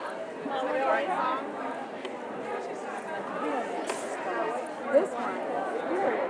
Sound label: Ambi, indoor